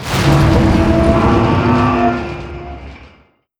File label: structural collapse; Stellaris